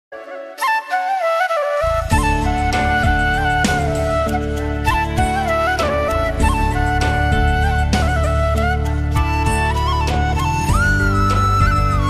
flute ringtone bansuri ringtone flute ringtone bansuri music; new flute ringtone